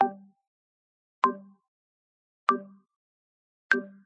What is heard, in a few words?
action window open; Samsung Galaxy S10; Velvet